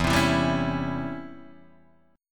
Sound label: C sharp Minor Major 7th Flat 5th E, guitar chord